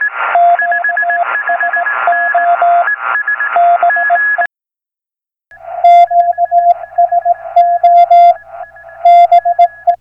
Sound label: CALF 40m buzz